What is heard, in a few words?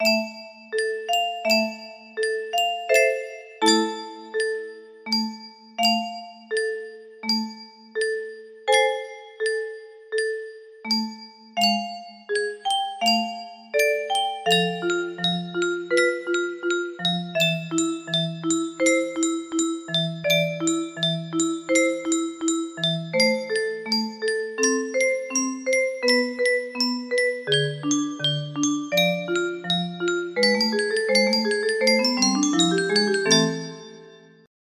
melody; fnaf